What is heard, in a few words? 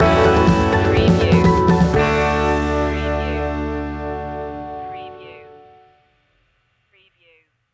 starting something new stinger